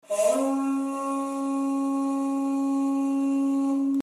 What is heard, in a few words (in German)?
Kuhhorn Poliert